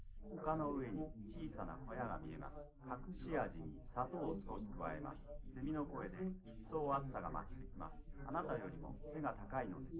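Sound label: male, live recording 250ms 5cm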